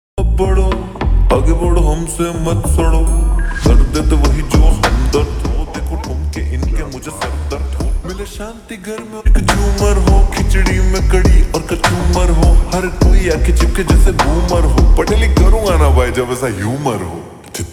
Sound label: Ringtone Slowed Reverb